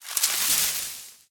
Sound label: bush, human step; Soundscape Overhaul